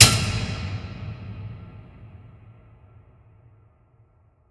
light power on switch; buttons

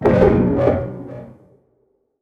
strider vox general; creatures

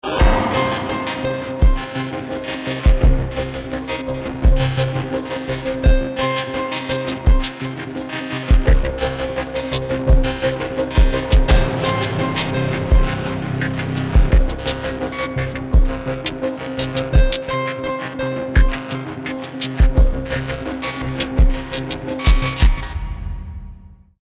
start round, music

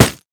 default impact bullet; physics; surfaces

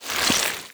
close, zombie blind, foley; creatures

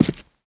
footsteps concrete; player

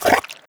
breath in, headcrab black; creatures

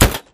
plastic box impact bullet

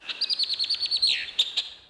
crane; ambient; anubis

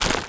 zombie move short, foley; creatures; Half Life Model Pack

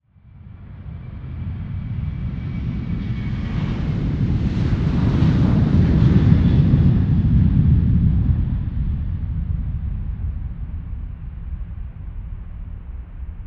airplane; ambient; Counter Strike Model Pack; CS:GO; nuke

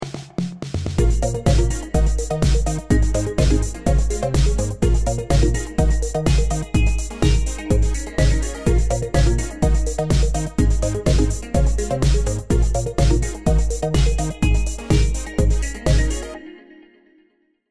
start action, music

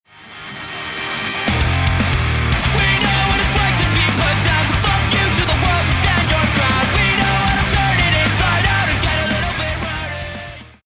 won round, music

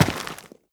step, footsteps, gravel; player

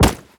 defuser bullet impact; physics; weapons

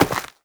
step, footsteps, player, dirt

